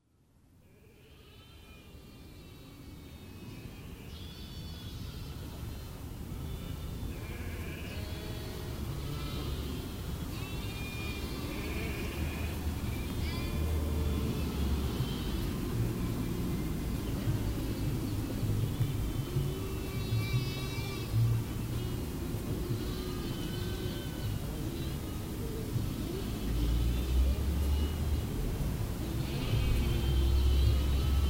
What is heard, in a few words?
sheepscape biesfeld